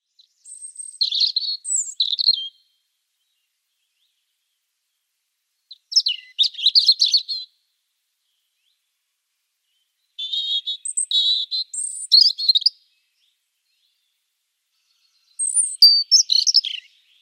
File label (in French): Chant de rouge-gorge familier mâle